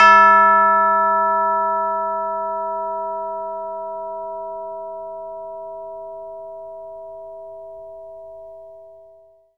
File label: CHIME F#4; ILIO; Synclavier Percussion 2; World & Orchestral